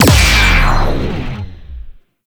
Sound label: Overguard Depleted; Warframe; Eximus